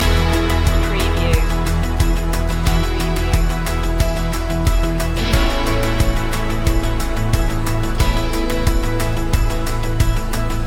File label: spread your wings loop